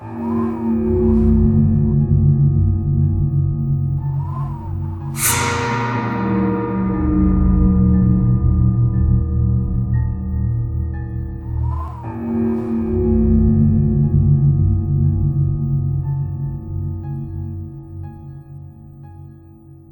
Middle Monster Boss Lady Wave

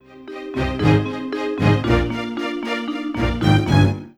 Achievement; entropy rising

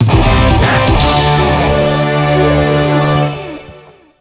Race Finished; Destruction Derby Arenas; PS2

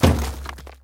Drum

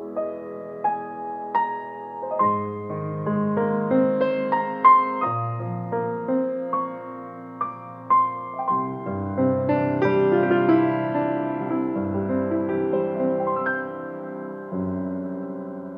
Dozing Off, piano; Xenogears

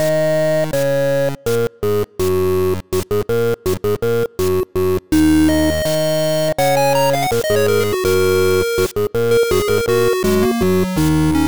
Jazz; Funky Duck; SiIva Direct Presents (2019)

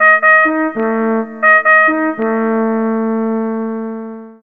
Horn Signals Horn; the battle for wesnoth

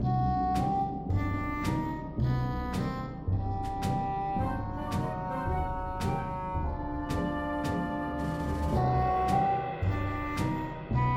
Sarco Pizz; Tintin Reporter: Cigars of the Pharaoh

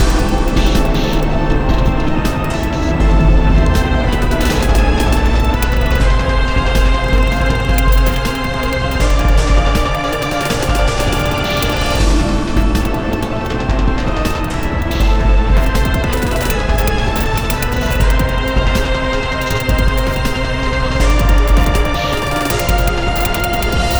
Steelworks Medium; rive wreck hack die retry; ps4

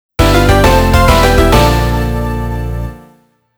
Jingle ~ Slight Break; Gyakuten Kenji

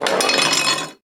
Girder Move; Prison Architect

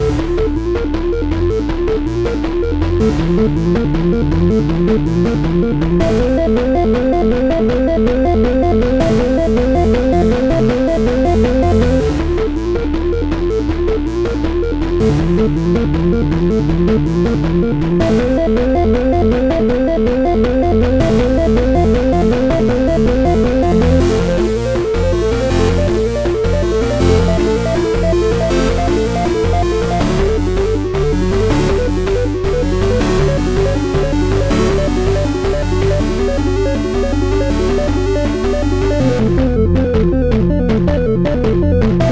Loop; Dual Blade: Battle of the Female Ninja

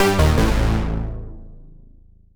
MUSIC EFFECT Solo Warm Synth Negative; startide macos switch windows gamerip 2017

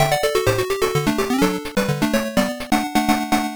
Baseball Quiz Good Rating; Mezase Pro Yakyuu Pico gamerip 1995

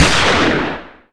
pistol; sack race

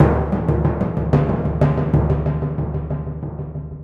Timp

